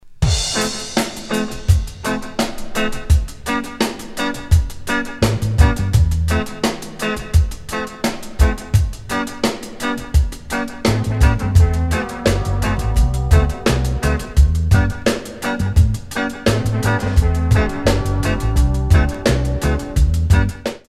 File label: reggae